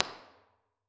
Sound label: Diningroom; MIT environmental impulse responses